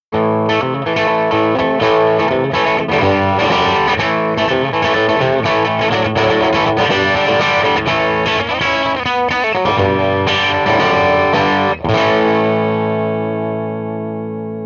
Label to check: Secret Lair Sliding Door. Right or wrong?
Wrong